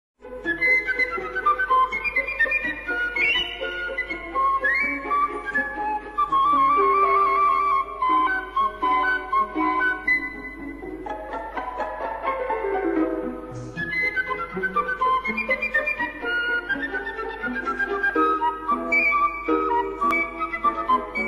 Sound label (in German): Piccolo Walzer